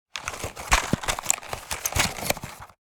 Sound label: Pharmacy box shuffling medicine packages